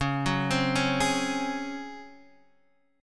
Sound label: C sharp Major 7th Suspended 4th C sharp Guitar Standard; C#M7sus4 arpeggiated; JGuitar